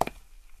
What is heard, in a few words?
tile step; Golden Crayon Sounds